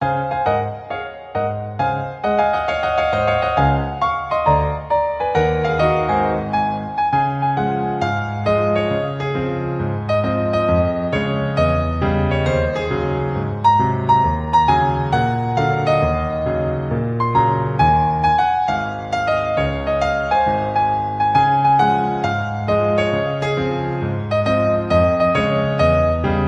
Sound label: Piano Cover